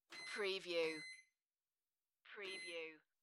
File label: Microwave Beep in a Kitchen; beanstalk audio